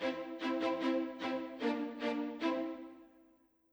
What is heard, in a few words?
Complete; 25 Rpg Game Tracks; dungeons